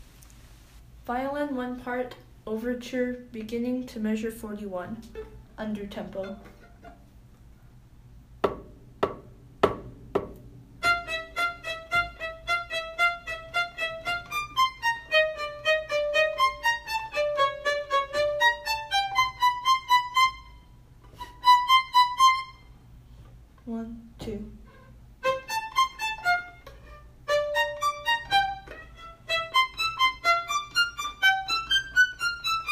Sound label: violin overture beginning to measure 41